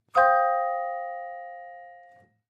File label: Clavichord Separate; keyboards